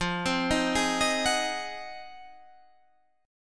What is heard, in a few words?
F Minor 7th Flat 9th